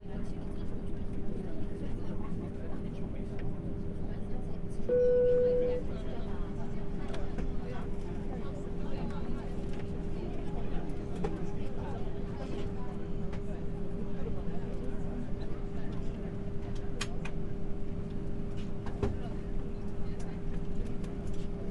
airliner voices luggage pre takeoff, airports planes; em172 stereo; aerojet; fvtt sonniss gdc audio